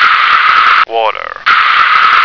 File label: water